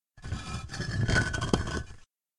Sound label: Deep Rumble Walls